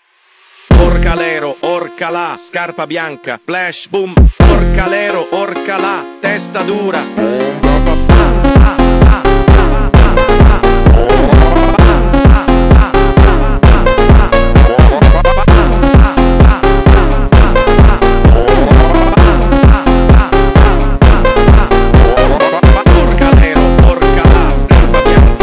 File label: funk